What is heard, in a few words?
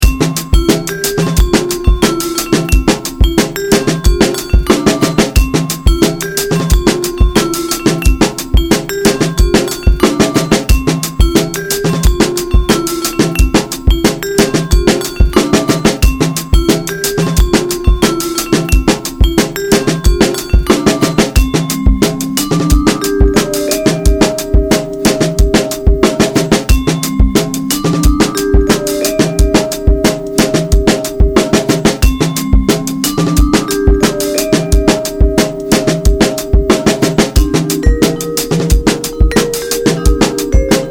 beat; moh samples